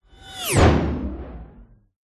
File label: cartoon anime fast whoosh impact whine